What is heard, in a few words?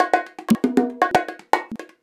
119 bongo; Soundscan vol.56; Modern Percussion Loops; AKAI